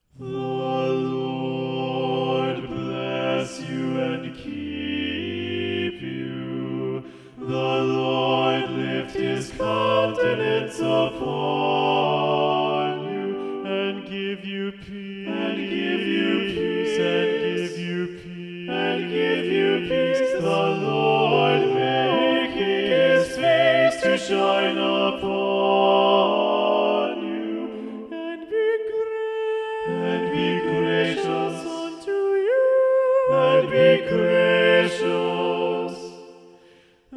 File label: Balanced Voices; choirs